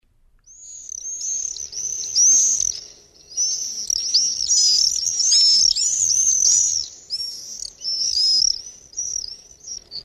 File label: Ebabil Common Swift Apus apus